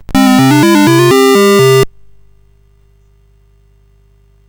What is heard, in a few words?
sid CSG8580R5; Frogger II-Three Deep